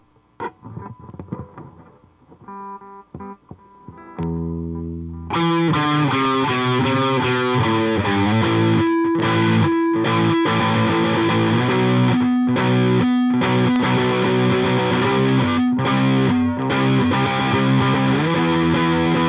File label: rythm